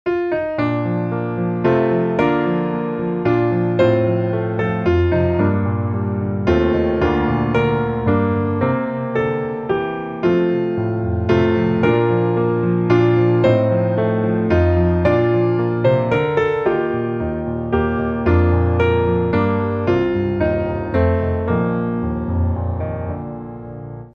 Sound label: piano